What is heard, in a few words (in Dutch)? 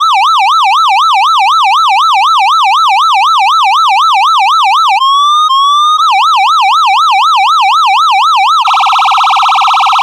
SFX - Fbisirene